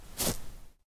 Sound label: ice, footsteps